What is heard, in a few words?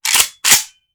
Machine Gun Foley; Sound Ideas; Guns